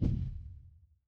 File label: impact thud